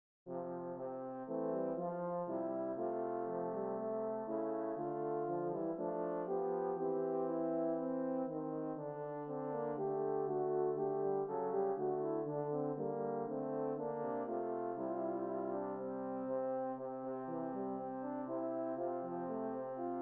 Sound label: Hymn 2 Horns